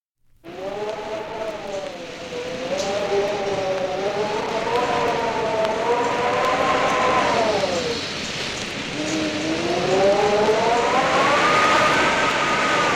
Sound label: Spooky Sound Effects